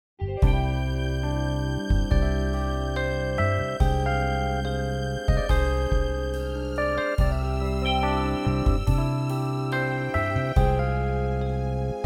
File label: C orchestral